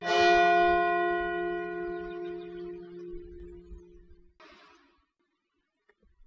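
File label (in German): Schlag, Glocken; Nikolaus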